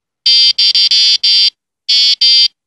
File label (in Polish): buzzer bez generatora piezo